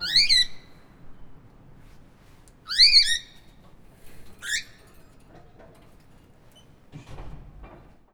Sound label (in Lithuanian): Banguotoji papugele